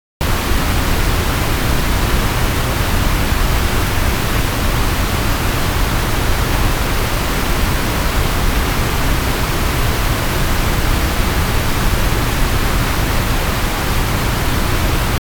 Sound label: waterfall; best service Blue Box; water streams